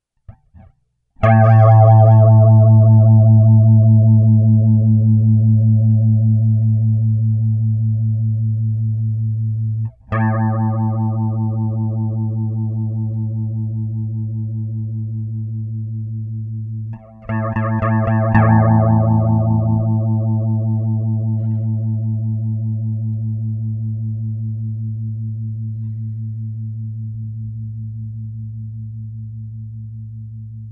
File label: GIT Flanger